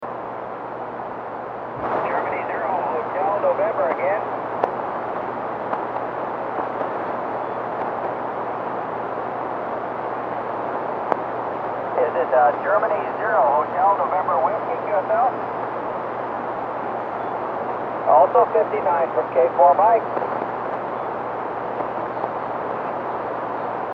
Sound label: ssb 7078